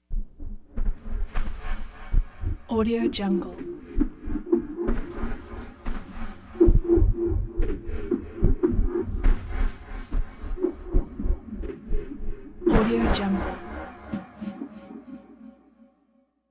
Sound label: tension wing whooshes chaotic